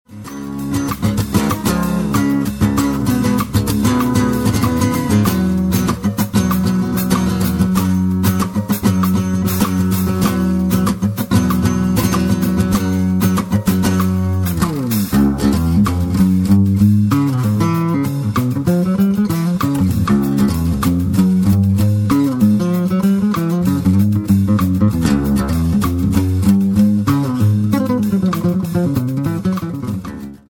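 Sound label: western song, guitar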